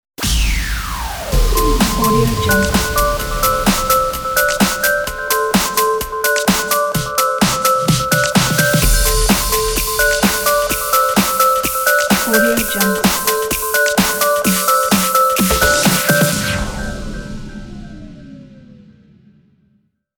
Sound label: dance logo